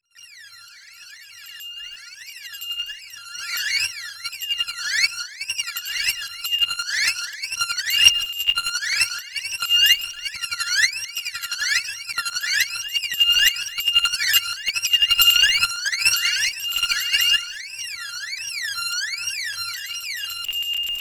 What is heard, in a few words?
Synthe